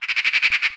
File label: magpie; World of Tanks Blitz; ambient medvedkovo